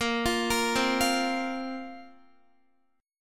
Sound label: Bb Suspended 2nd Bb Guitar; arpeggiated; 4E,4A,5D,5G,5C,6F